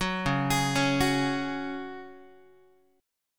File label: C sharp Suspended 4th C sharp Guitar, chord; arpeggiated; 4A,4E,5A,5D,5G#,6C#; 9,9,11,11,10,x